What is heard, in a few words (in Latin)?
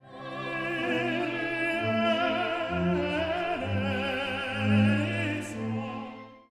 Kyrie Tenor